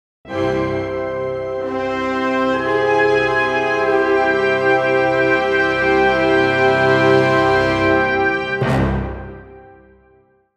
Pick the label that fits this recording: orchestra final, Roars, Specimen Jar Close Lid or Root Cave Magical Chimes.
orchestra final